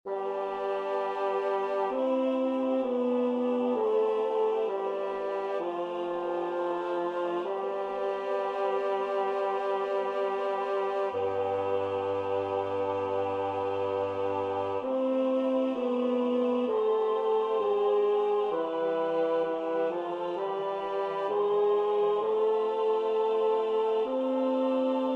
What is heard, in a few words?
BASS